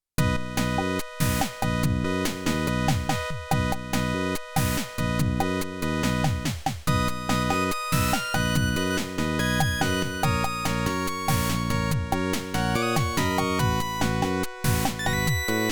The Way Back Home (Internal Percussion); Cave Story

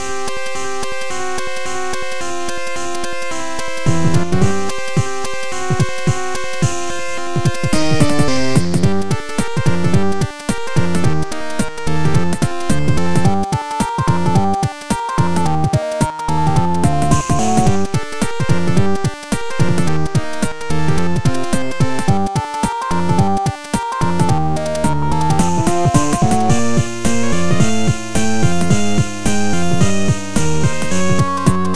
Cave Story (Internal Percussion)

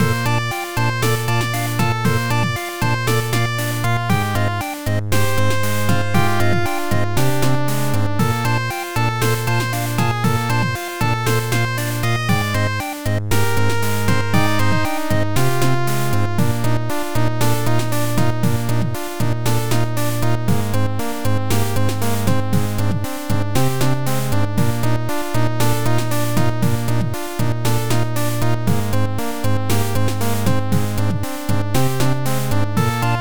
Internal Percussion

